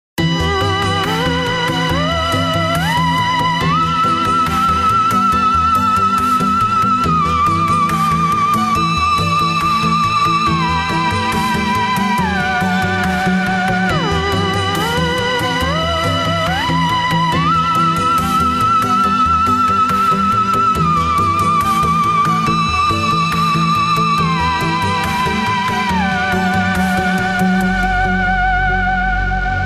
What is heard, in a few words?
Scifi Theme Royalty Free Theme Music